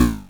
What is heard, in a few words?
Drums, Hits; DCM8